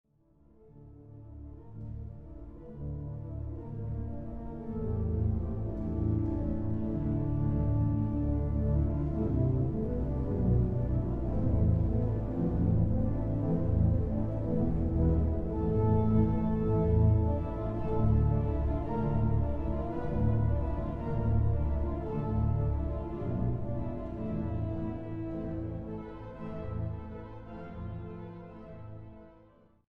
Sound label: Marche Heroique, orgel; symphonique